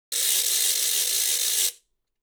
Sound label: ratchet